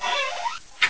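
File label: door open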